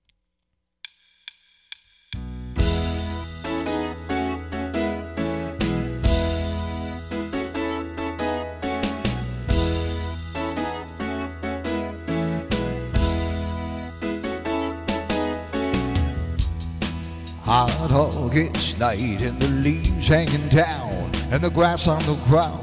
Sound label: Tribute Band